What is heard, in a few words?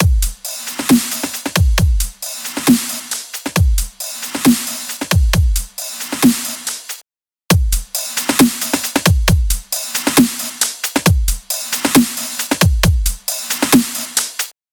Drum Loop Attack Transients